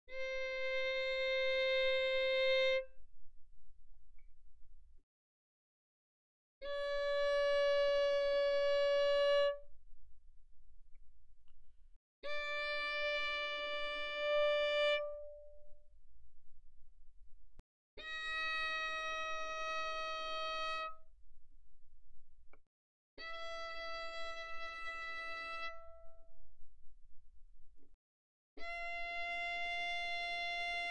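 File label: Violin arco mezzo forte sul D C5 B5; Strings; violin2012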